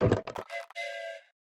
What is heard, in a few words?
Grab Toy Train; Lethal Company